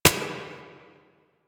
Turret Low Clip; Weapons; APC; Warframe; Lasrian

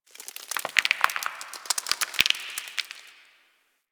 Ocean Rock Crack Small; Ambience; Warframe; Grineer Ocean

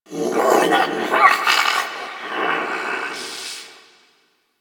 Sentient Lair Interior Creature; Ambience; Creatures; Misc Creatures; Warframe

